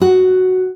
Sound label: Classic Guitar, notifications